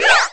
girl toss phone; HeavenStudio; trick Class

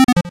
result Ok; HeavenStudio; freeze Frame

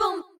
rah Rah Sis Boom Ba Boom girls; Cheer Readers

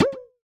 UI cancel; HeavenStudio

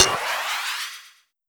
rocket pin prepare; HeavenStudio; launch Party

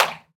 tap; HeavenStudio; tapTrial

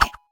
thump; HeavenStudio; dog Ninja

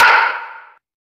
hit; HeavenStudio; spaceball

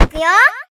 throw, jp; HeavenStudio; pajama Party

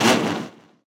slow car far; HeavenStudio; freeze Frame

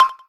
ball Right; HeavenStudio; bouncy Road

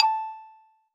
rocket note; HeavenStudio; launch Party

